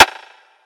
Coiled Rimshot; Cymatics

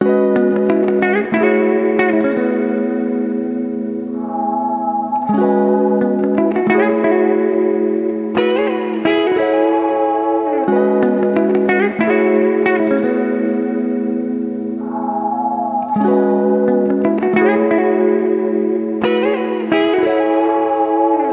Crescent Moon Melody; Cymatics

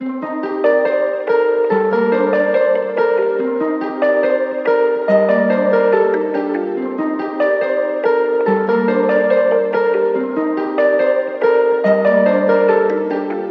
Purple Daze Melody; Cymatics

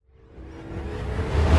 Modulated Reverse Impact; Cymatics

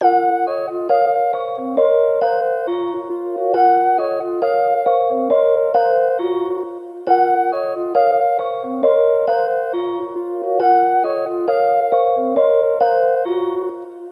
Skeleton King Melody; Cymatics

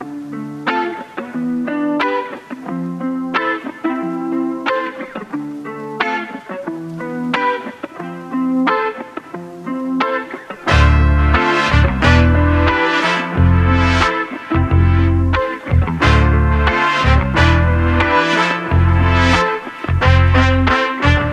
By The River Melody; Cymatics

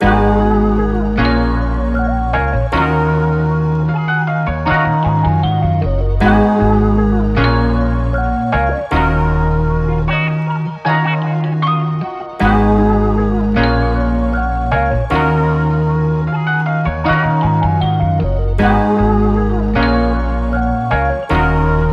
A Glimmer Melody; Cymatics

